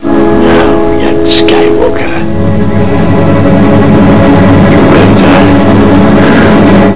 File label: jedi zap; cstrike; amx jedizap v3